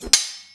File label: sword; spiritheroes